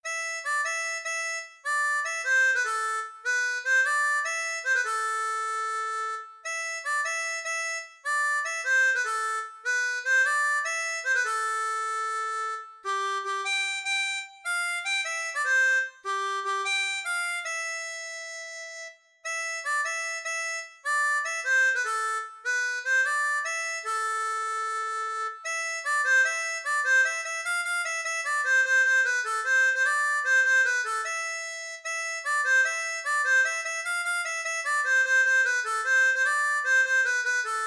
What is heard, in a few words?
Harmonica Israel; Folkloriques